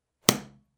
knigi zvuk

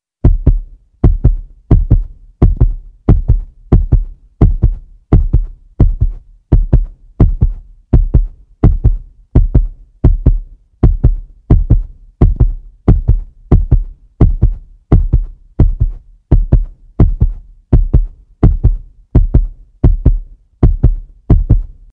zvuk serdcebienija

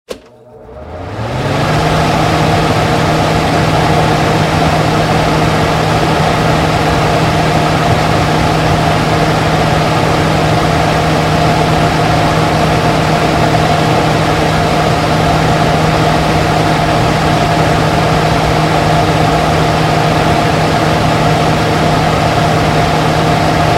shum vytjazhki

